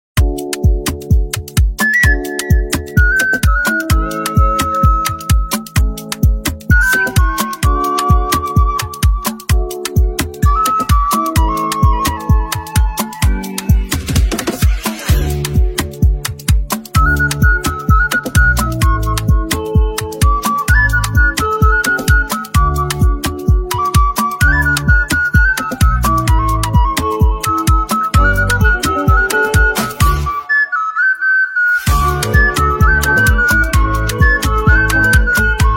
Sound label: Flute Version